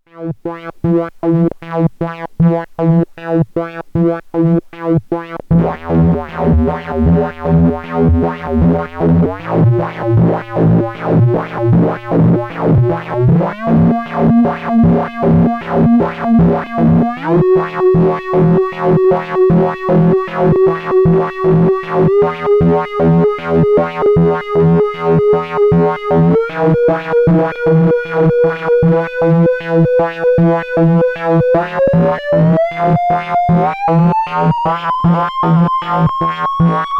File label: MS-20 Self Triggering Ring Drone; MS-20 Patches